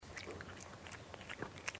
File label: cat eating